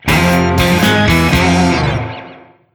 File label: Sting Finish